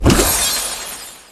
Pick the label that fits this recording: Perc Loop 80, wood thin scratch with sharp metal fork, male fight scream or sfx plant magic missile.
sfx plant magic missile